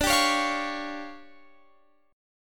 D Minor Major 7th Double Flat 5th, D, Guitar Open F; DmM7bb5; strummed